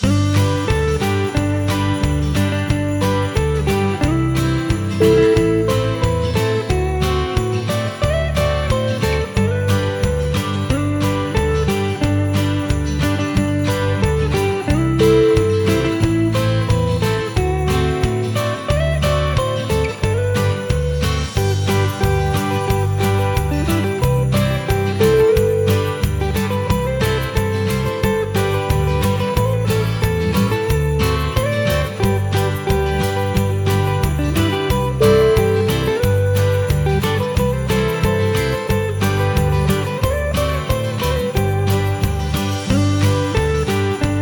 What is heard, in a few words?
jing bells country